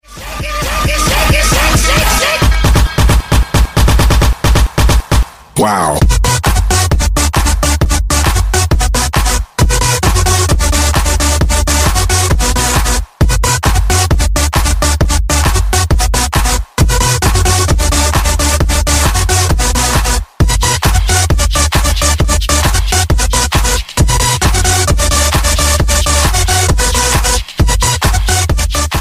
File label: ringtone funny ringtones; Hip Hop